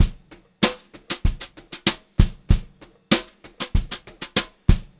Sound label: BEAT, Brushed Drum Loops